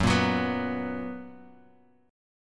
F sharp Major Add 11th; F#add11 strummed

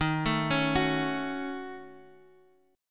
Eb Minor 7th Flat 5th Eb down Guitar Standard; arpeggiated; chord; JGuitar

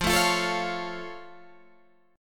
F Augmented F Guitar Open G

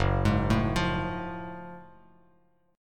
F sharp Minor Major 9th, Bass Standard; arpeggiated

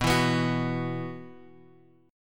B Suspended 4th; Bsus4 Chord {X 2 2 X 0 2} strummed

